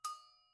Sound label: agogo Bell mp, Struck Idiophones; Agogo Bells